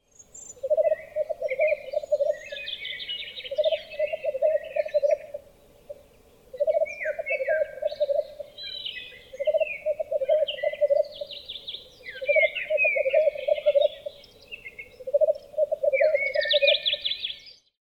obyknovennyj zvuk tetereva

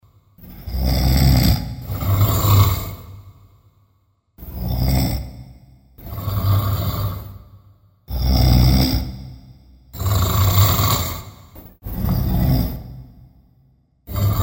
dvojnoj hrap

